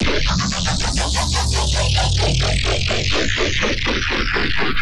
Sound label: grainneuro, bass